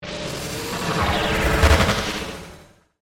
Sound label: Stinger Whoosh, Production, Element, Stinger, Whoosh, Dark, Digital; Stinger Sound Effects; BlastWave